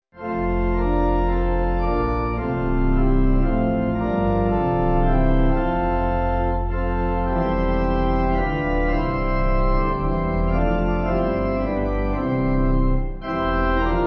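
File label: Pipe, hymn backing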